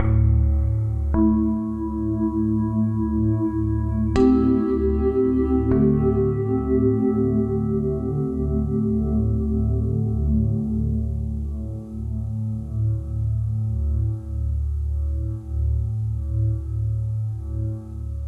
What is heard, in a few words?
Calming Bowls - D Mixolydian 105 bpm; MIDI Clips; Tonal; Motifs; Ableton; Factory Packs; Mood Reel